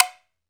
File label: PERC, Dance Mega Drums; Best Service; PERC MIX